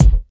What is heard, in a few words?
clear kick drum sound G sharp key, steel kick drum sample tuned to the G# note; kick drum samples